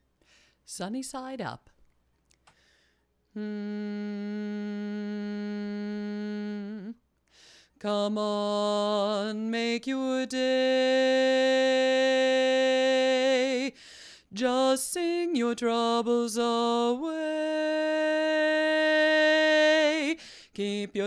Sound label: bass alone